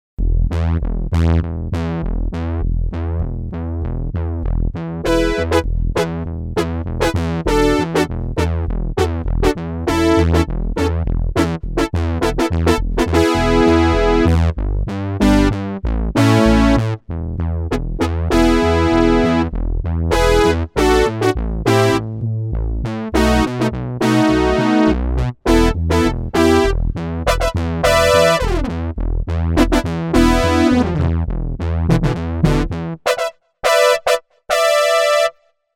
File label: brass delay, Korg DW-8000; Factory Patches